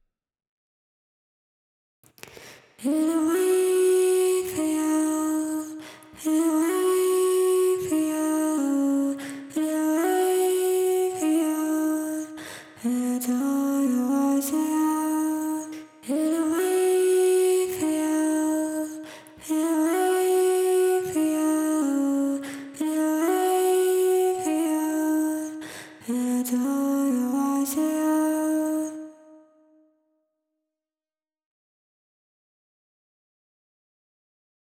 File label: vox